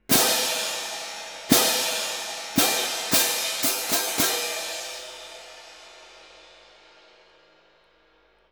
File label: 110 cymbals, F#min, Orchestral arrangements; PowerFX - Downtown Orchestra